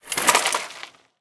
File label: obj rattle; tanks; War Thunder